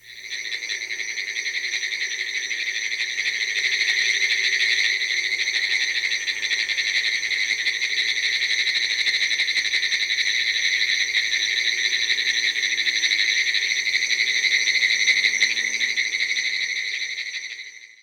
pinewoods treefrog chorus

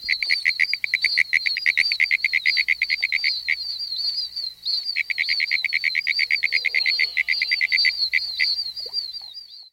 pinewoods treefrog

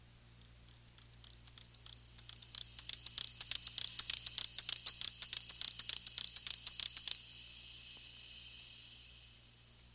little grass frog rhythmic

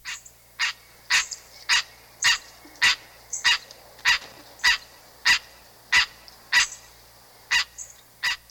green treefrog rain call

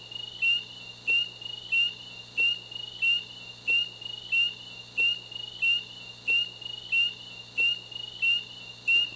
spring peeper

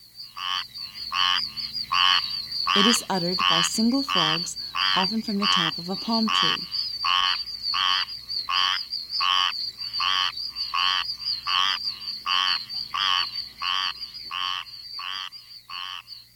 squirrel treefrog

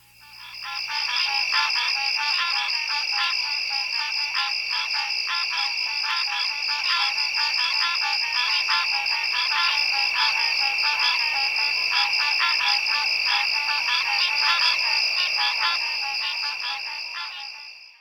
green treefrog chorus

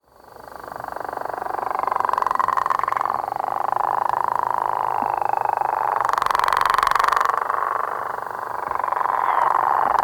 florida gopher frog

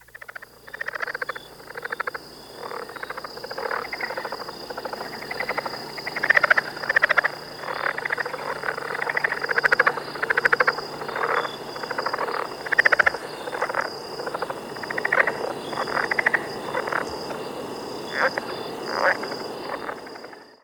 southern leopard frog chorus

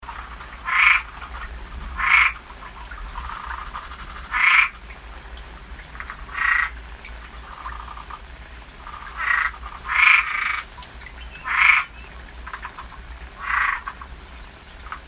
Cuban treefrog calls

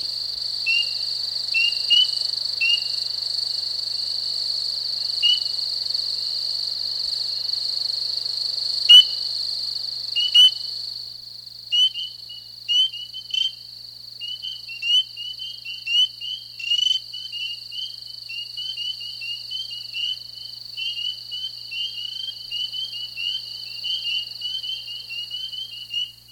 spring peeper chorus mating call

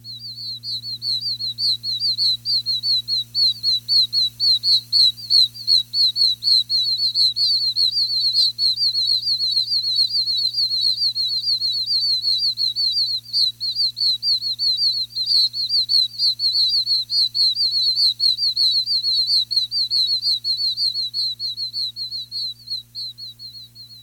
oak toad breeding call

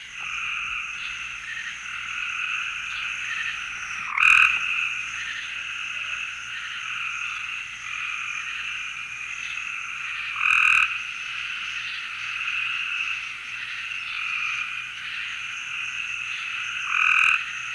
southern gray treefrog mating call

